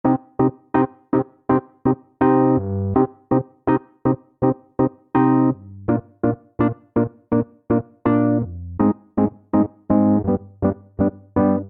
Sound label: Progression Rock Soul Swampy i - VII - VI - V C Minor 82 bpm, Electric Keyboards; Ableton; Factory Packs